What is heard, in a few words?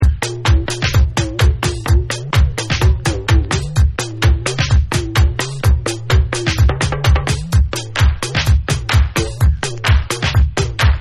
TALKING DRUM